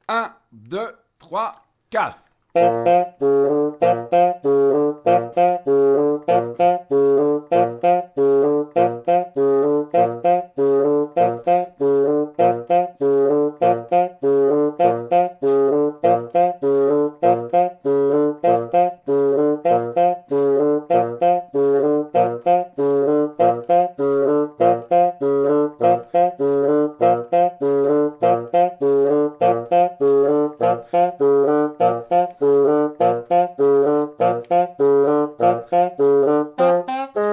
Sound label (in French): baby elephant sans basson